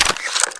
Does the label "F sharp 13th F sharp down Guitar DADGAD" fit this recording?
No